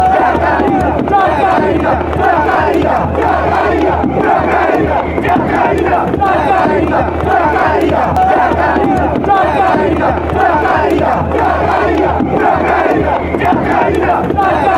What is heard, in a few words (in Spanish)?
chacarita fanchants